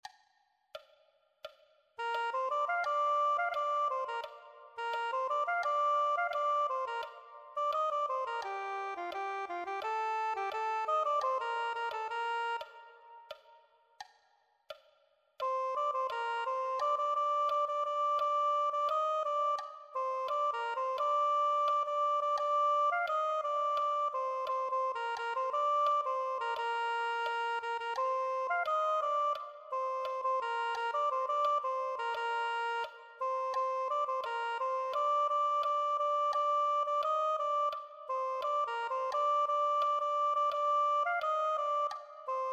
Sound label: soprano saxophone